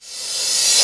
Reverse Cym, Cymbals; Vengeance; Essential Clubsounds Vol.4

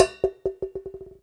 Prepared Drums

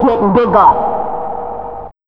vox, Big Beat Drumloops, electro 125; USB Soundscan vol.25; AKAI

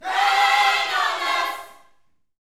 Vocal, Gospel, PHRASE; Spectrasonics

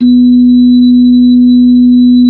B-3, Hollow; Keyboards of The 60's and 70's

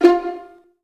24 PIZZ ST F1, Virtuoso Strings; ILIO; Kirk Hunter Virtuoso Strings VOL-1

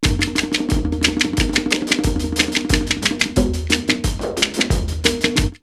Yaoundé 90, drumloops World & Ethnic; X-Static Goldmine

